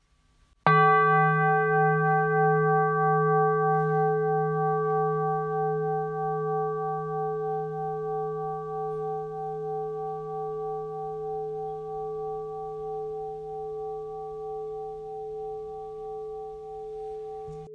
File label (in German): Klangschale 432 HZ 36 cm